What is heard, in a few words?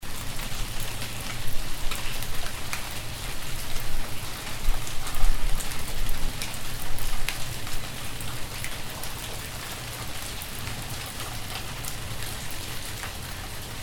light rain looping